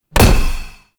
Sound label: Bash Hit; Warframe; Warframes; Hoplite